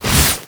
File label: sand magic